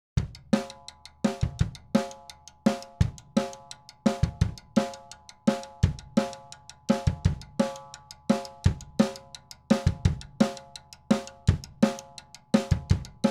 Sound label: drums